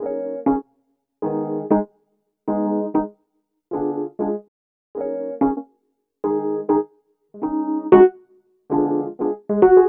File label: Keyboard